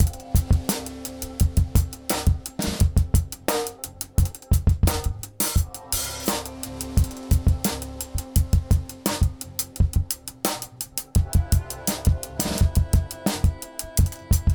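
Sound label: XPRESSOR WET WARM AUTO FAST drums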